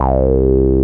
A BASS; CMP - Analogon

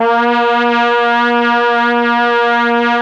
BRASS; ILIO; Propeller Island Legendary M400

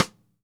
Radio King Cross Stick; Sonic Foundry (Sony Creative Software); Vital Drums

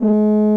G#3 TUBA

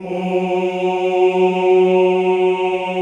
CHO F#2, Ooh

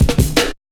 Drum Loops, Jungle

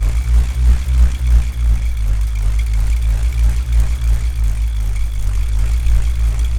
BULLROARER, Primitive Instruments

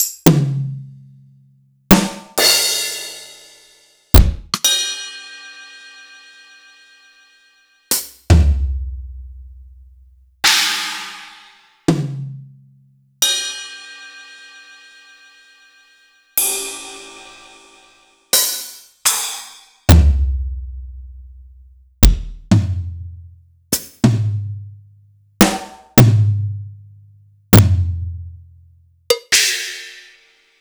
Roland TD-10

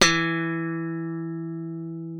E3, slap thumb; Xsample VOL-1

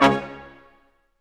BRS Quintet staccato; Roland L-CD702

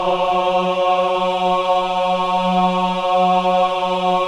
CHO AH, Choir of Ahs; Roland - LA Composer vol. 1

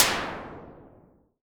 SNR, Roland SH-09 synth drums

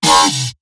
short wub F, bass

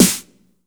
SN TR, DRUMS; USB Soundscan vol.02; Underground Hip Hop; AKAI

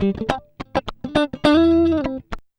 104 FUNKY, Electric & Acoustic Guitar Loops

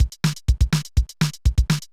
Loop, mxr; classic machines

